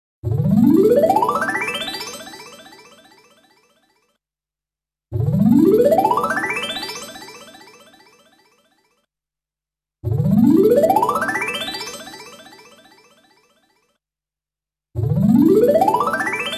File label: nokia sms